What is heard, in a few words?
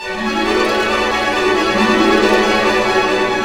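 128, orchestra; East West; Scoring Tools; Fantasma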